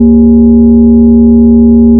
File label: fm cello single; FFTimbre